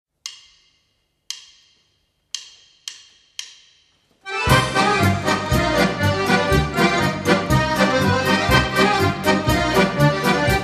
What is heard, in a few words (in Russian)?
ОРКЕСТР ГАРМОНИК - КАРЕЛО-ФИНСКАЯ ПОЛЬКА